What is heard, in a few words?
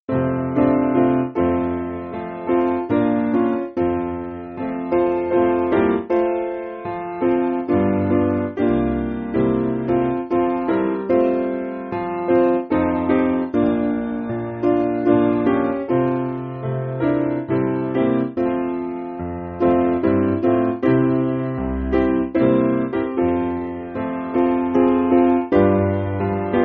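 Piano, Backing Files for Churches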